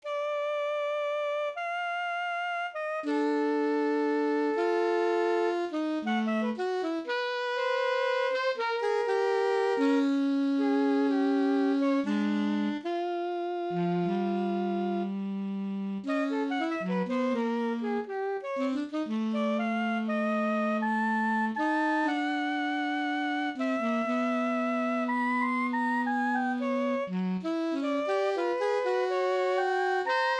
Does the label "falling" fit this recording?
No